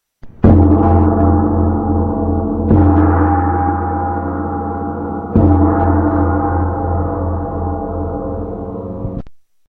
gong ageng; instruments

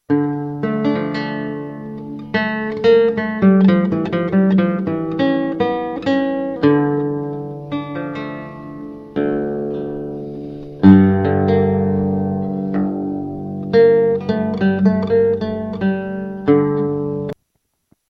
chitarrone; instruments

